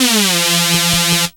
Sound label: big, synths dance rap